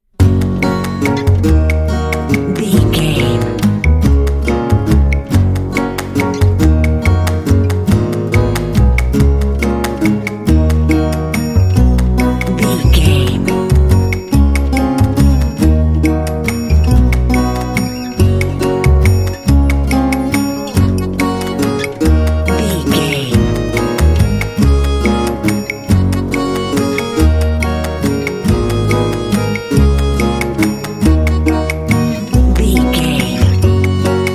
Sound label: quirky tiny waltz